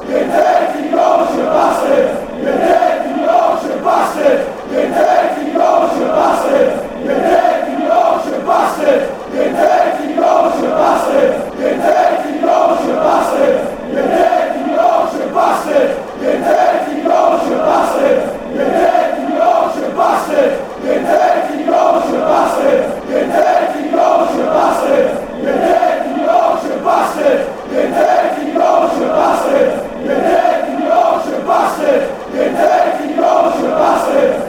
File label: dirty yorkshire bstards